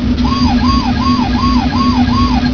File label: siren; Policeman Pete; siren wail; vehicle mode